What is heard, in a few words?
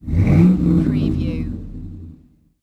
Truck 5.3 Liter Rev Exhaust Perspective; Vehicle / Transport Sounds / Lorry / Truck Sounds